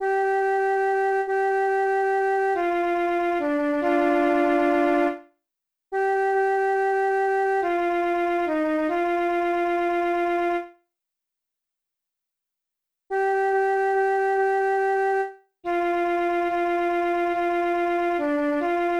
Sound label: band flute; BGM; infinitefusion